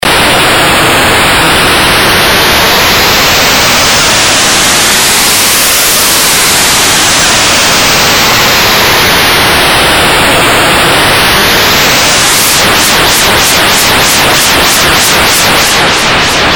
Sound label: noise, modular